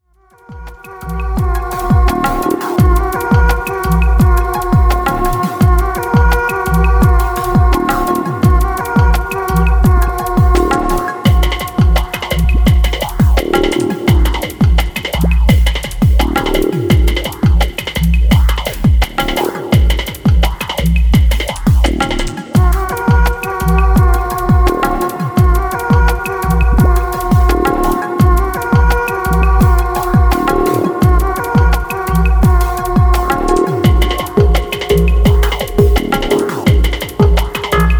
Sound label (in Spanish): Voz Instrumental